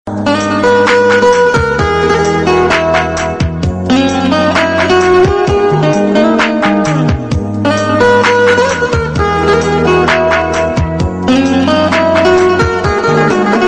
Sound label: cool background musical call notification tone ringtone